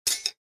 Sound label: Metal weapon crafting